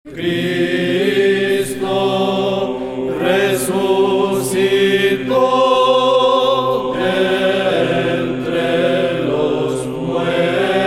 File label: Christ Is Risen Spanish Tone 5